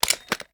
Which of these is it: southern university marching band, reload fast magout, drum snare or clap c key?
reload fast magout